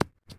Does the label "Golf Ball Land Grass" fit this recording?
Yes